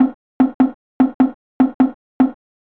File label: rhythmic example shuffle